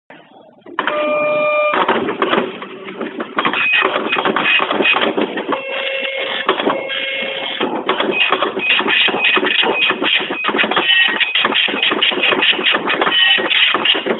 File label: Epson Matrix Printer